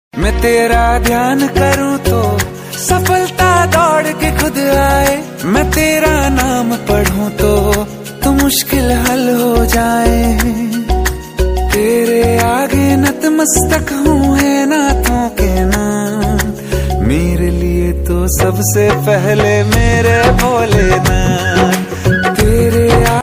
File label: song